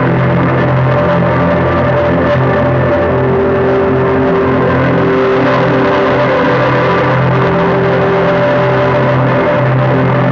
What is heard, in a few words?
fog distorted; ゆめ2っき